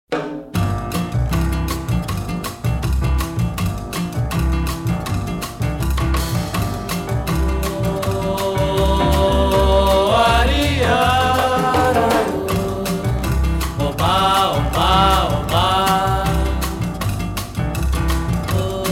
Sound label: Brazilian Soccer Song; Nike Brasil Commercial